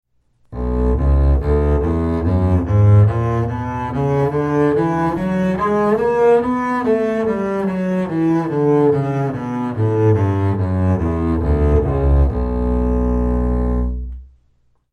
Bass b minor

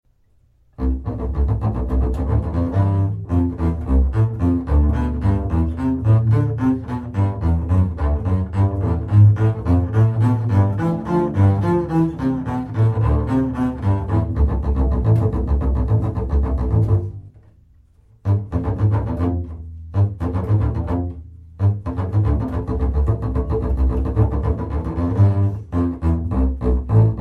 Bass set; Beethoven

